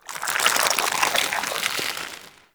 Tendril Grasp Tendon End; Warframe; Warframes; Broken Frame

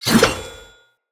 Dagger Melee Whoosh Heavy; Weapons; Player; Swords

